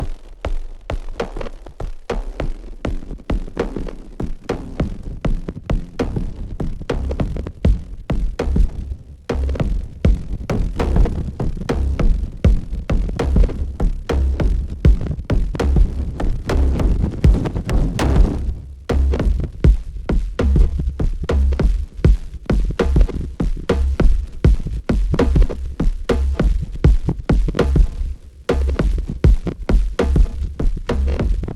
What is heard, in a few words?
OP1 Beads Drum Loop